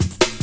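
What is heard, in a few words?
kick + snare, break beats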